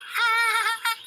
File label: elephant trunk cute laugh